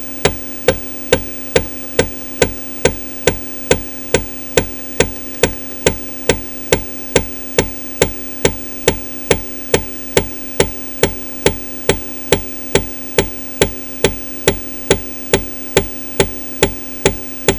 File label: drive click A500; uae